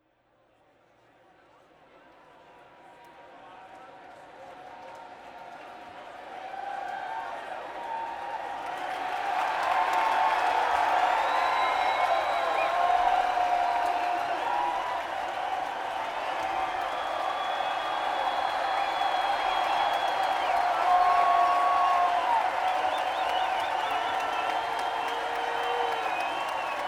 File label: Crowd, Saratoga Performing Arts Center